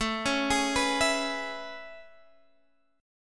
A Major 9th A, Guitar, DADGAD

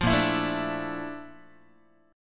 A sharp Major Sharp 11th, Guitar; strummed

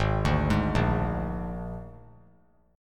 Ab Suspended 2nd Flat 5th Ab, chord; arpeggiated; JGuitar

